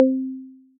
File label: Marimba, mallets; Coastal Bones Marimba; Ableton; Factory Packs; Synth Essentials; Collision